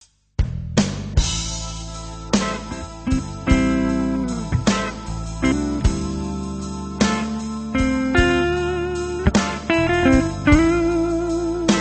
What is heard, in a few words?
deluxe strat blues